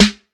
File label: fat snare single hit g sharp key